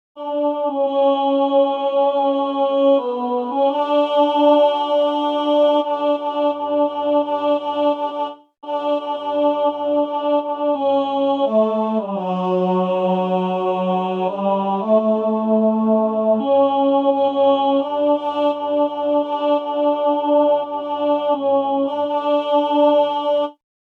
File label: tenor